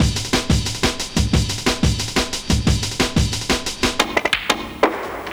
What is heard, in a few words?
Pool Hall Beat, Drum & Bass; Fluid Dynamics